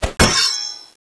Blow

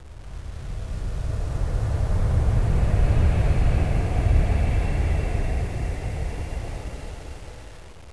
desert

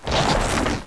Golem Attack

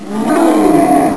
Bull Attack

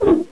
Swing Weapon

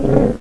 Hunter Die

